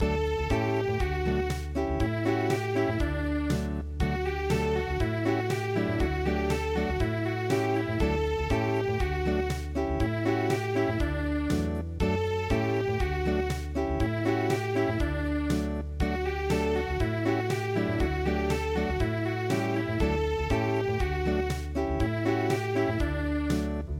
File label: Acoustic